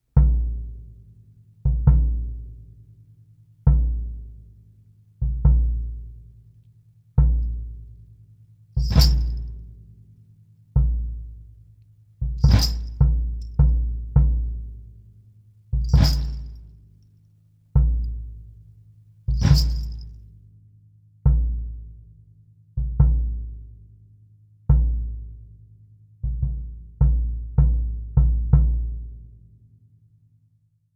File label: Gretsch Convert, Gretsch 16x18